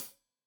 Hat Tight, Hi-Hat, Cymbals; Deeply Sampled Drums

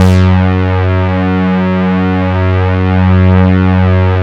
SYN OSC ARP, Microwave; Sound & Vision - Gigapack I CD 2

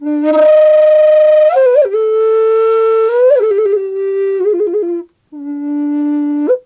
D wild flute

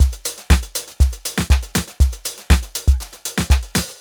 120 beat, Nu Jazz House; PowerFX

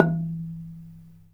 RANAT THUN E3; Spectrasonics - Heart of Asia CD2

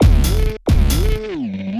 Swoop Bass, Drum N Bass; Sonic Foundry (Sony Creative Software); Knutrix Drum & Bass

